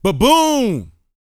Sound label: VOCAL F; ILIO